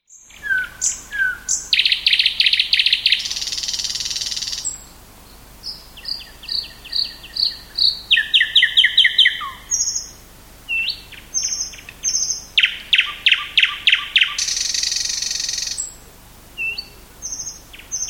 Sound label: thrush nightingale